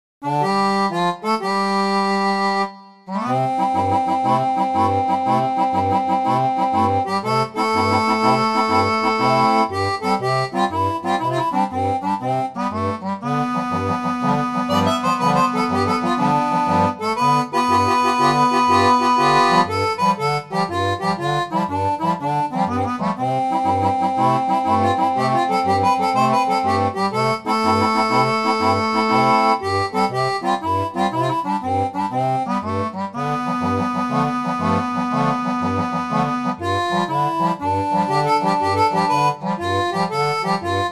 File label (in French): orgue barbarie seraphone